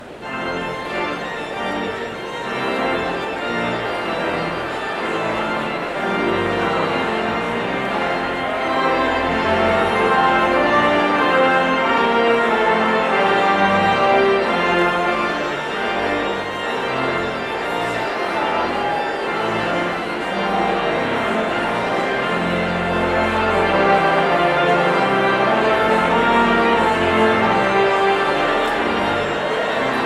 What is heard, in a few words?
Postlude; Oh That I Had a Thousand Voices